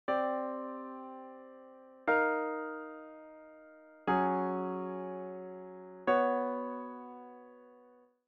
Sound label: add9 chords